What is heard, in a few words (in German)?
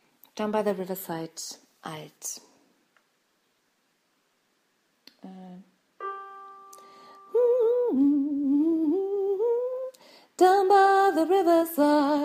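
Down By The Riverside Alt, gospel choir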